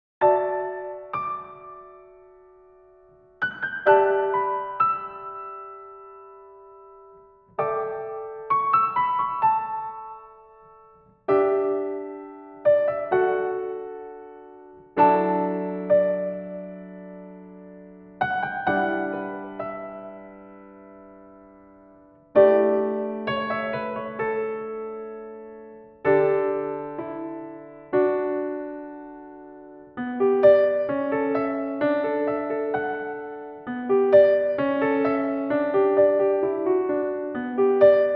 PIANO

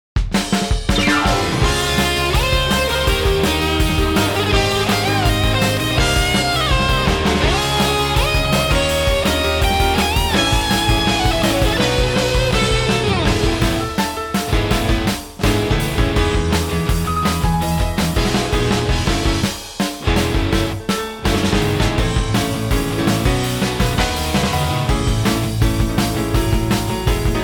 OFF VOCAL

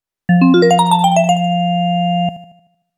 sound logo, チップチューン; オクターブガールズ～チップチューン箱守八千代～

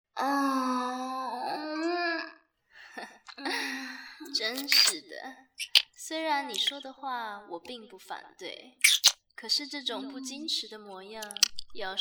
Energy draining neck kiss, ASMR

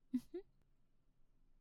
breath; Playboy Bunny; Garden

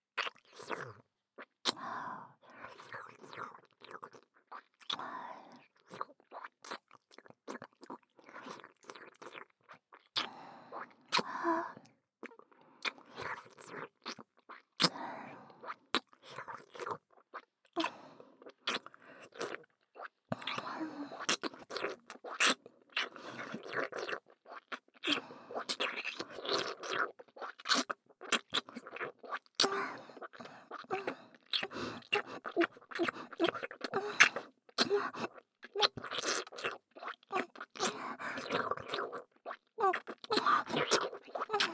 Kiss high; 搾精悪魔ちゃんの5日間寸止めゲーム～最後の日に失敗しちゃったら惨めな結末を迎えるよ～

